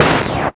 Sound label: RADIO, 160 BPM; Ueberschall; Drum & Bass Flash Trackz